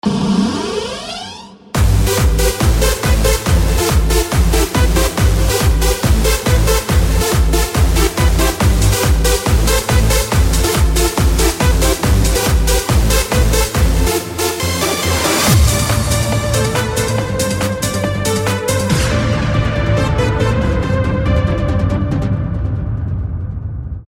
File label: freefall